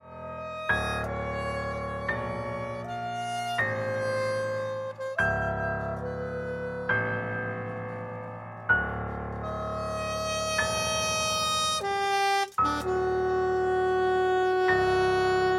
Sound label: For saxophone and piano